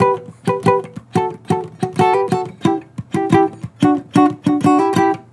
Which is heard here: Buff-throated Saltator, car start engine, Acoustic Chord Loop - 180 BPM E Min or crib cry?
Acoustic Chord Loop - 180 BPM E Min